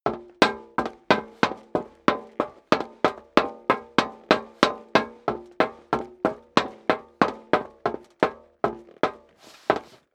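step dress boots metal dry walk sequence, footsteps; schritte; menschen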